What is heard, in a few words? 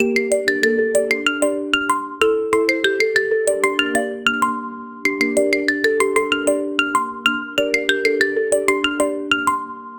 playful water themed puzz, plucks